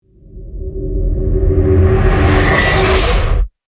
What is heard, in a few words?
Wipe Wipe; Ninja Tracks